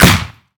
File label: tnt pop